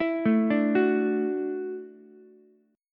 E 7th Suspended 2nd Suspended 4th, down, Ukulele; E7sus2sus4 arpeggiated; JGuitar

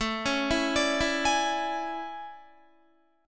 A Major 11th, down Guitar; AM11 arpeggiated

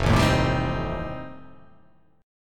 F 9th F, chord

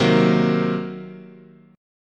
C Minor 7th Flat 5th, guitar chord

